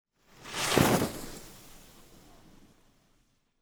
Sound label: skiing increasing speed